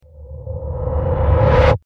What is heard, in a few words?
dramatic buildup whip